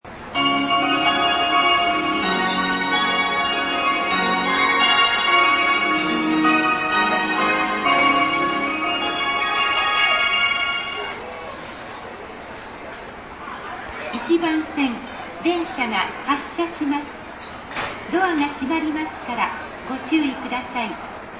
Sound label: maihama hassya; JR東日本-舞浜駅; 1番線（海浜幕張・蘇我方面）; 1番線発車放送